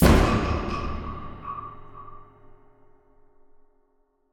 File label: Player Fast Death